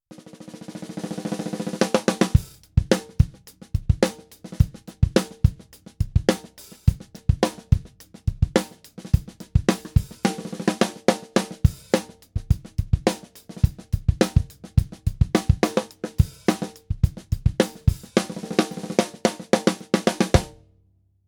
Stahl mittel gedämpft

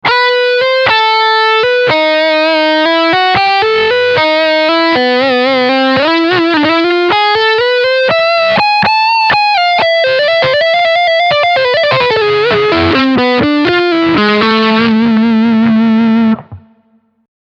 Leadsound beide HB

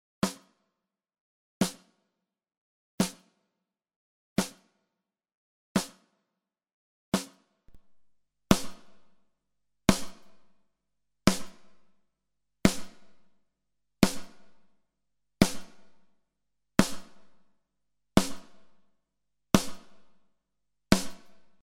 Snare Schlapp Knackig

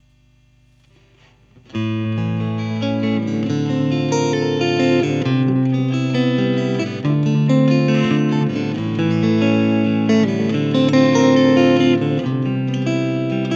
Badewannen Boost

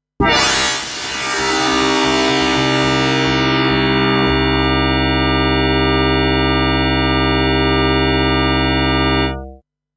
Timbre Frame Spektrum mit FM, FM Synth

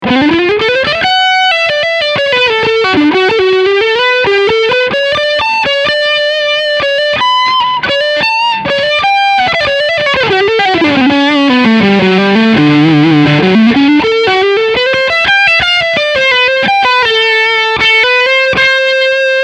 Leadsound Steg no Boost

